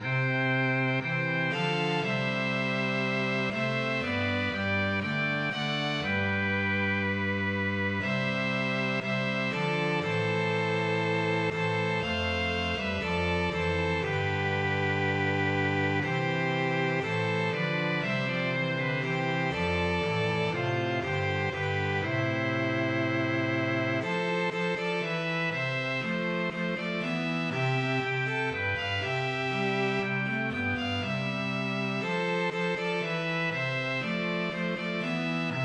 Ensemble, MIDI; Tant que vivrai

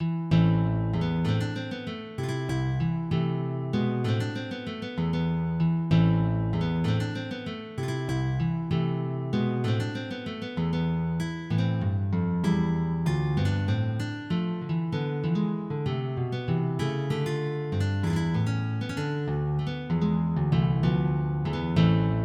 Menuet, MIDI

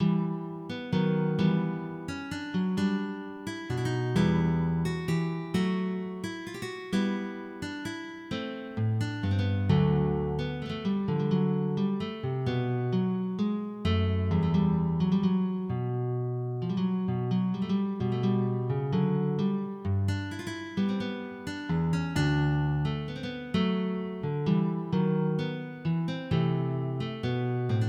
Sarabande, MIDI

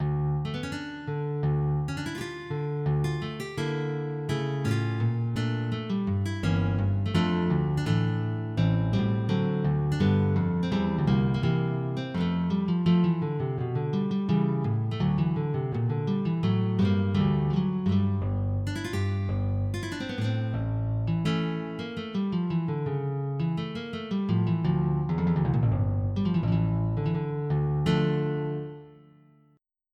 Prélude Ré mineur

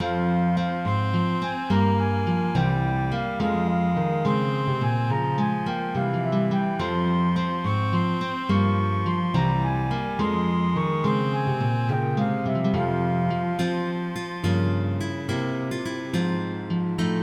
Gigue

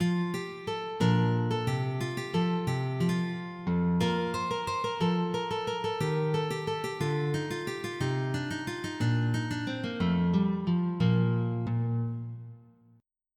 La volta; Mris Lettis Rich

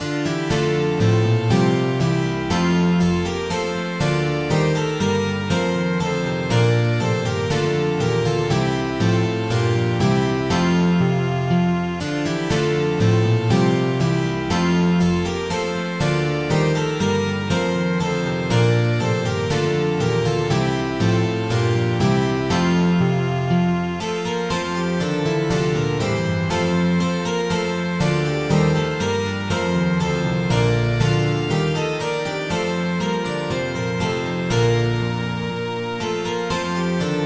Intrada, MIDI

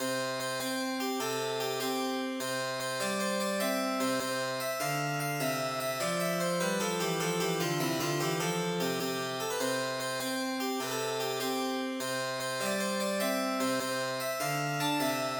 Irish Air

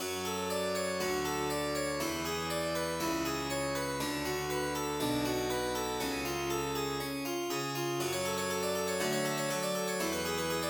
Harpsichord Intro, MIDI; Solferina

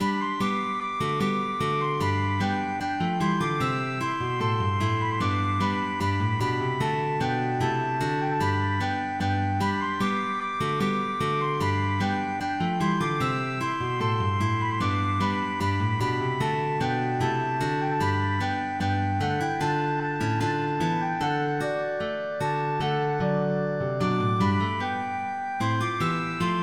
Bourrée

